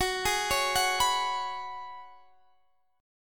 Gb Suspended 2nd Suspended 4th, Guitar Half Step Down